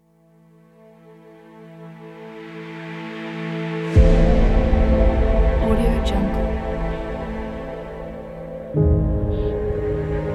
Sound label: emotional power motivational cinematic